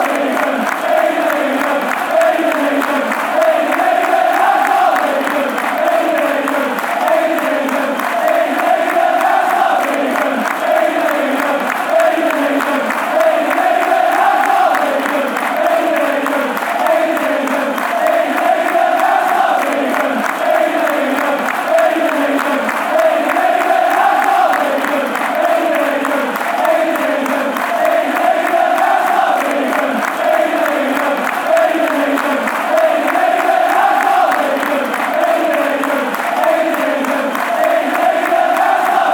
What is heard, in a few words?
eden eden eden, chants; cfc